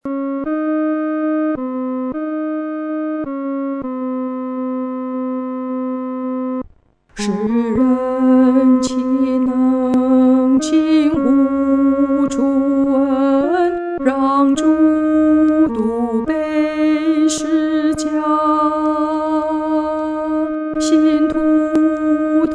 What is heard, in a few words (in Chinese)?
独唱 第二声; 岂能让主独背十架